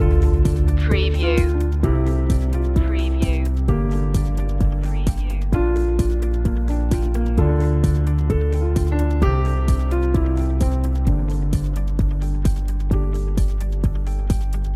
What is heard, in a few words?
asian corporate loop